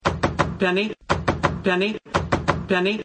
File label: knock; penny; sheldon